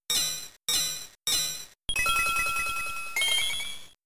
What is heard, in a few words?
Heal Bell; Pokemon; Gen 3; attack moves; RSE